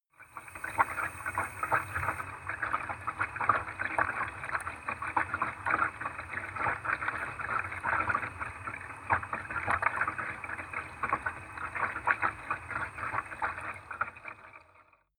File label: bathtub underwater